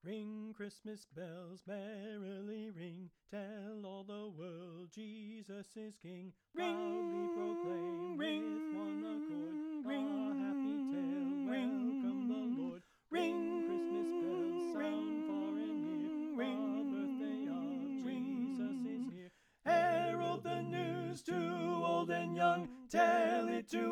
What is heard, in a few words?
Slower Alto Left